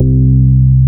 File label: BAS MKS-50; Best Service Gigapack 2